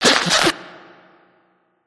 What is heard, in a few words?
cry